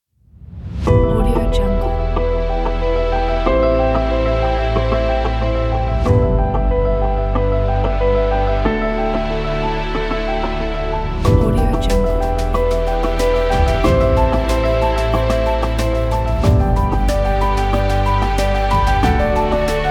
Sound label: epic inspiring